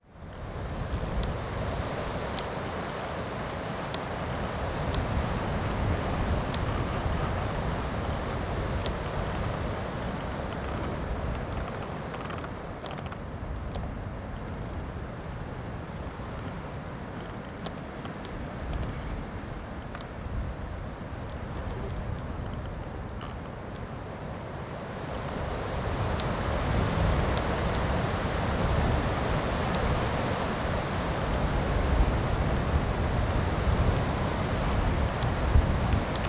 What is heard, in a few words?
nature forest ambience windy trees creak in wind; felix blume